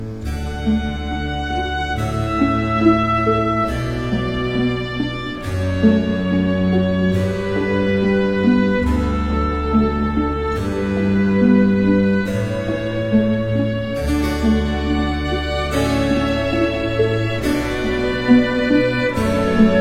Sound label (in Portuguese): Progressao I V vi iii IV I IV V